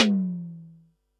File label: tom, Pearl SC-40 Toms